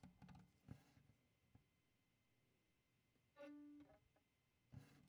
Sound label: Violin